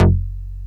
DASH F#1, BASSES

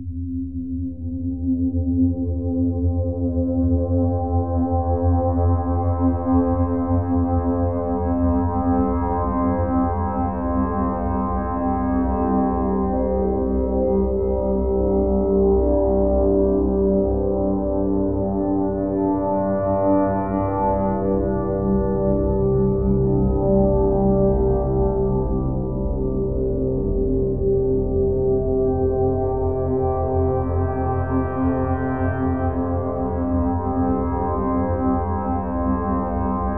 MOOG MIX; Bolder Sounds - Granular; MOOGULAR 3

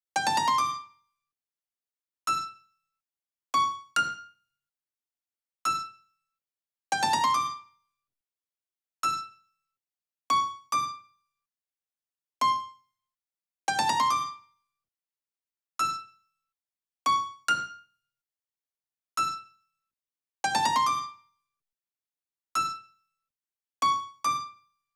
PWM Kit 142BPM Verse - Piano DRY, Gmin; Constr Kits; Melody & Synth; Vengeance; Essential Clubsounds Vol.5

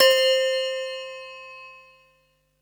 prc C3, MIRAGE; Retro Sampler; Ballery

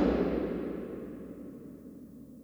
Tails, Drum Bits, Verb Tails

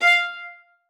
F5, VIOLA SPICC; Xsample VOL-5

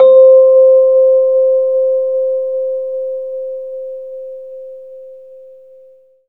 Rhodes C; 3D Sound; 3D Keys VOL-1

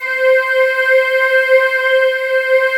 DEEP BRTH, BREATHY; Synth

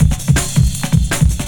Power 162, breaks

